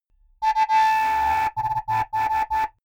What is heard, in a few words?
typewriter sequence